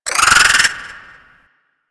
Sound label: Landscape Cave Creature Calls, Ambience; Warframe; Venus Open World; One Offs